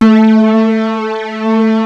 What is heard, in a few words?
DEEP; BASS SOUNDS